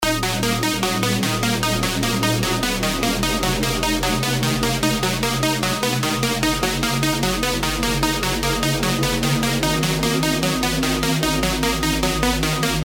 ARP Sequence; knights

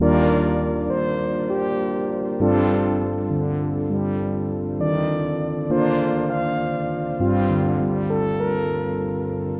Lead Brass; hera

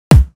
punchout kick; breakout

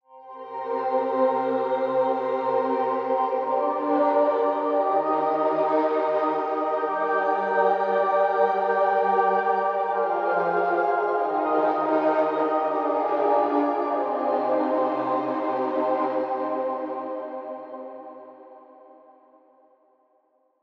angelic pad; odyssey

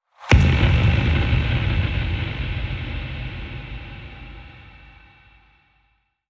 deep impact; paragon

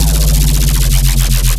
Dispersed Bass Fill Eb 150; radiance

